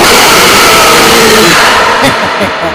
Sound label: jumpscare; expunged; baozi